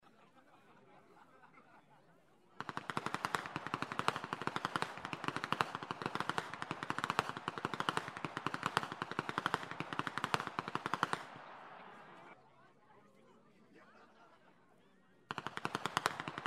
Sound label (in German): schnalzen, flachgau; MAXGLAN II; in St. Georgen am 01.02.2026; Allgemeine Passen